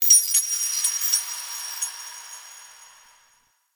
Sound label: Moon Chimes Small F; Ambience; Warframe; Orokin Moon